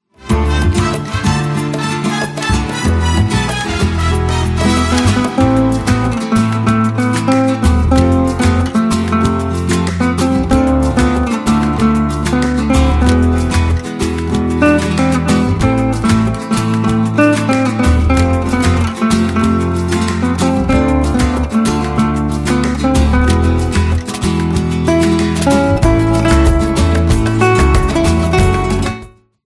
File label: guitar